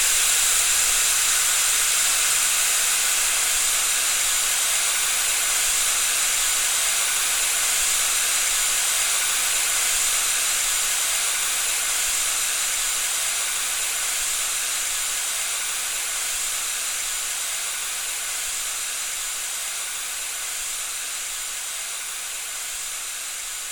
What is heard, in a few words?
air leak; horror